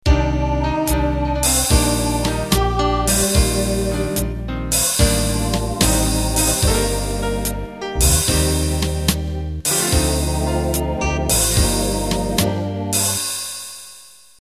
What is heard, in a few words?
Slow Jazz Intro, MIDI